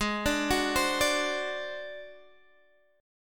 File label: G sharp 7th Flat 5th G sharp down Guitar Standard, chord; G#7b5; arpeggiated; JGuitar